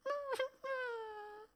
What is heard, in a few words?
Grub Sad Idle; Hollow Knight